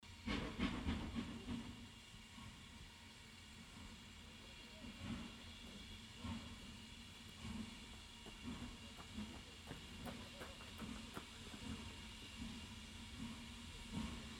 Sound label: Steam Train Chug; north yorkshire steam train, grosmont; field recordings